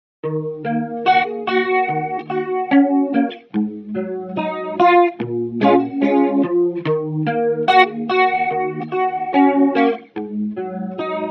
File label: swooshing choruser guitar